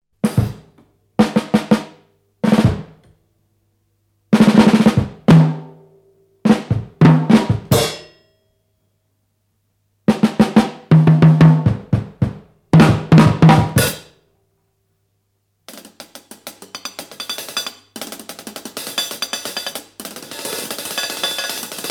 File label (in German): schlagzeug